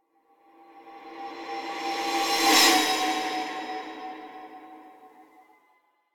Impact Metallic Lower Moaning Impact - Passing Semitone; Hollywood Edge; sound designer's toolkit v2